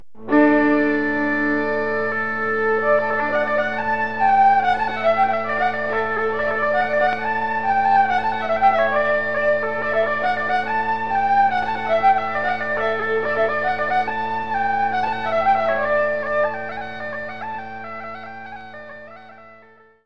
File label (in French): Allemande Claironnante - Belgique